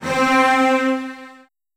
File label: C3, Virtuoso Strings, 16TH; ILIO; Kirk Hunter; CL 16TH